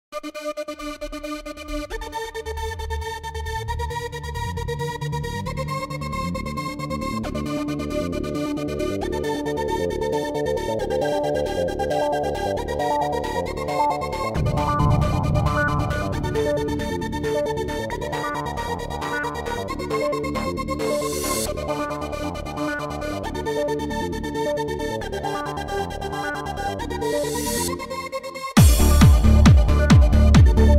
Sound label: Dancetrax Vibes Music